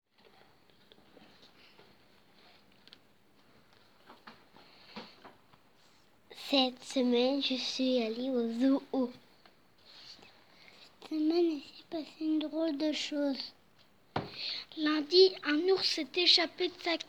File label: Vocal